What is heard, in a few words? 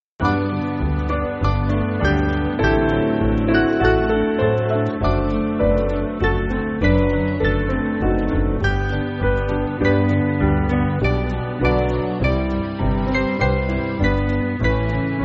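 Band, Music Backing Files for Churches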